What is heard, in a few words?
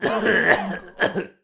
man dry cough